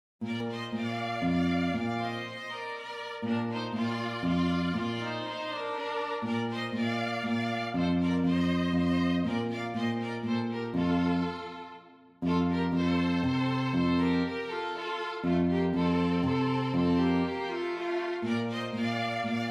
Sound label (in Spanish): Minueto